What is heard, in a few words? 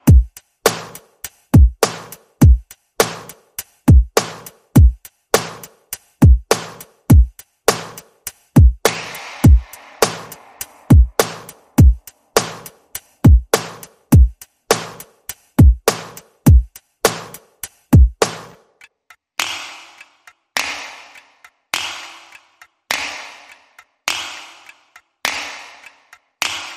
Amazed By You, Perc and FX Only